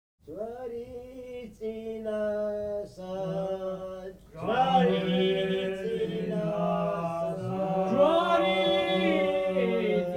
Georgian Folk Songs, Mamakatsebi; Jvari Tsinasa; Agmosavlet Sakartvelos Mtianeti folk songs

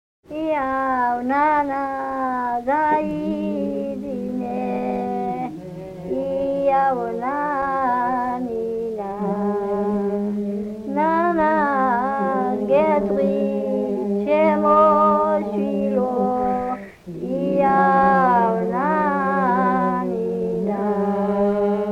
Nana Kartl Kakheti; Agmosavleti

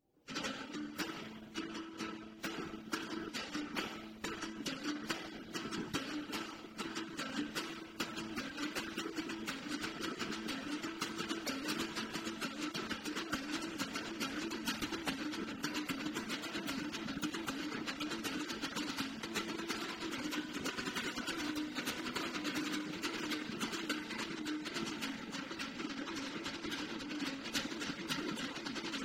kaxeti; Buqna

